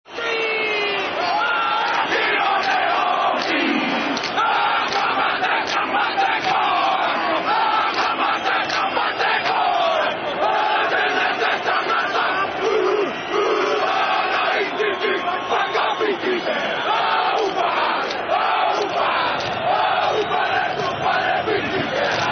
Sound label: haka